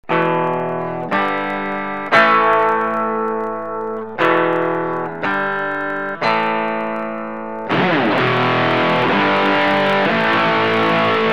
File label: DS-1 mod clean dist